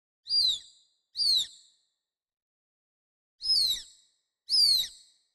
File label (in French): Cri du papangue